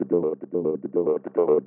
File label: 144 filter stutter; VOCAL OR VOXY LOOPS